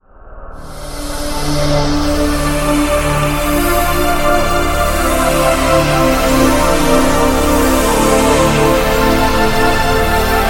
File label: Cinematic Padilius; Magnus Choir